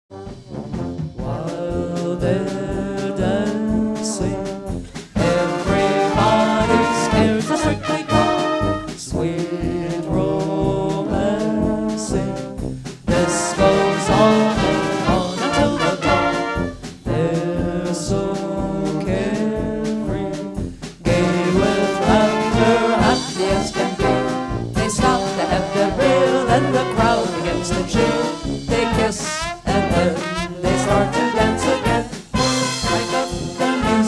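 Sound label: Polka, Brass Band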